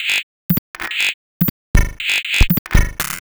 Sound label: Clackers, 120 BPM, Percussion; Sonic Foundry (Sony Creative Software); Underground UK House & Electro